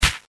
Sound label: throw